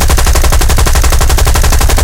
m1919 loop right, guns

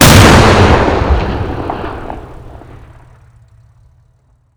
Projectile Sounds; Red Orchestra 41-45

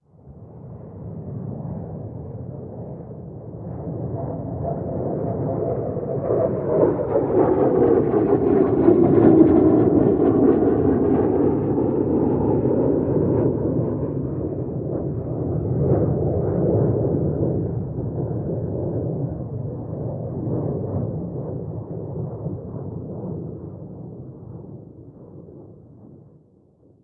jet flyover int

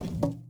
ui pickup wood med; Rust

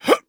throw

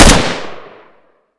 Inf Weapons; Red Orchestra 41-45

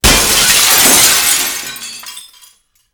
airport skylight glass; Call of Duty Modern Warfare

